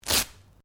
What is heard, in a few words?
Clothing Velcro Rip Short; Movemement sounds for various articles of clothing; Ripping Sound Effects